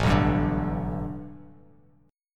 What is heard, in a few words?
A 7th Suspended 2nd Suspended 4th Bass Standard; A7sus2sus4 strummed